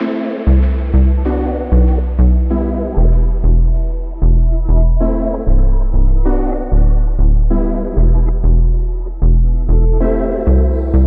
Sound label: 96 BPM E Min, Melody; Avarice; Cymatics; Infinity Melody Collection